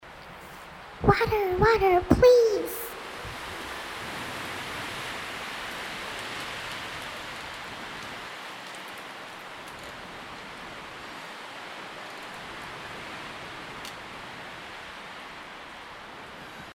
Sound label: water 完整